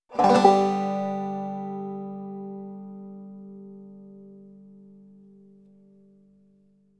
der roll

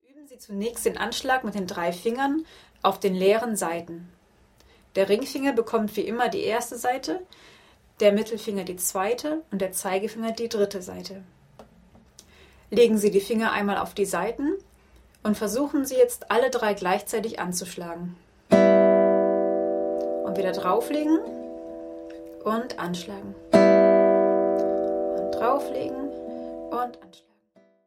ein neues zupfmuster

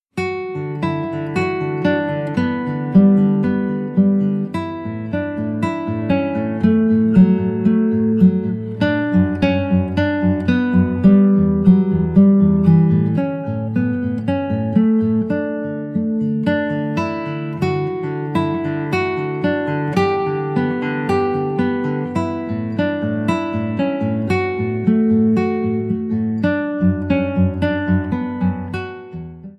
glocken

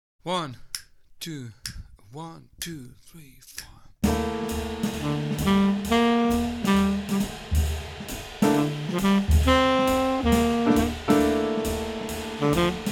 g dorisch play along

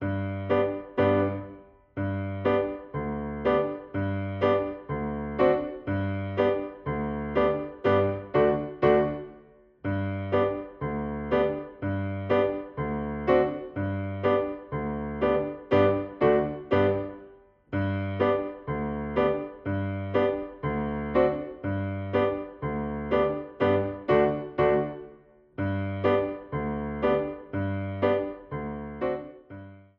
irisches fiddle lied mitspielversion

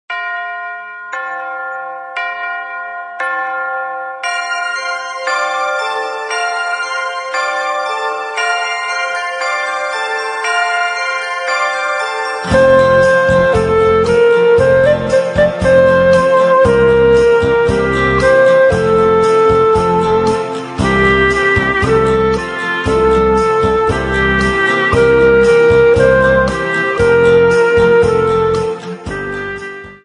kling gloeckchen kling

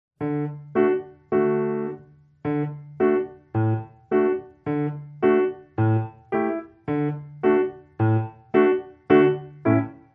irisches fiddle lied; uebeversion

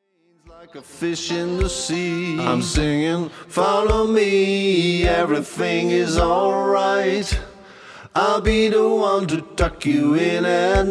ohne ukulele

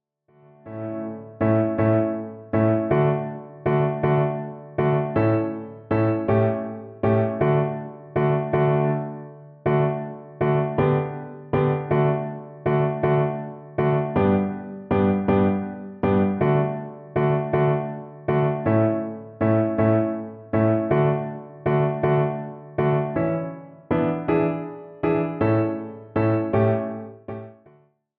scherzando D dur

